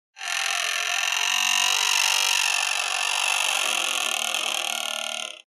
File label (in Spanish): Chirrido; Banco de Sonidos - Facultad de Art; Díaz - Márquez - Loustau